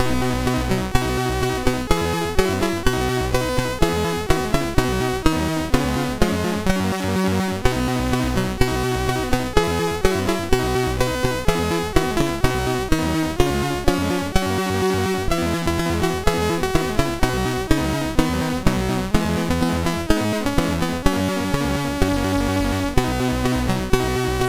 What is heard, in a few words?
Invest end, MOS6581R2; sid